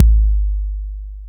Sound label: TR-808 Kick